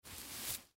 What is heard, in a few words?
Wig Long Hair Take Off Head; Dressing; Human; Blastwave; Foley